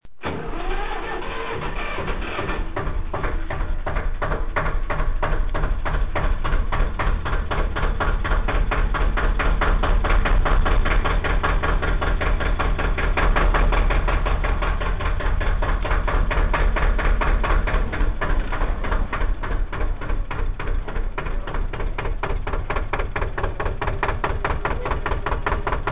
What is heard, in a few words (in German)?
dieselrossklang